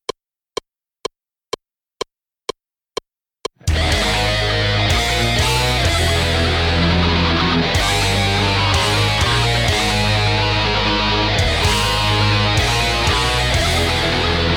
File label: WAH